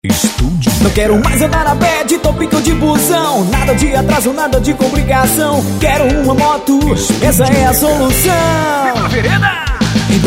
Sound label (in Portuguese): JINGLE; YAMAHA VEREDA; Juazeiro do Norte